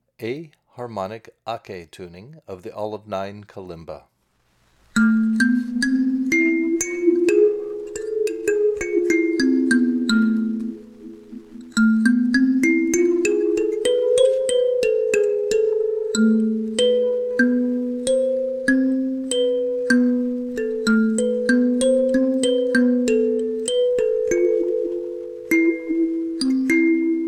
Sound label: Olive Tuning, kalimba; Olive-9 Kalimba; Magadi Olive-9 Kalimba